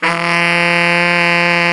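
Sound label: SAX Tenor growl; Tenor V-sw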